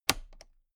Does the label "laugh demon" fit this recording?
No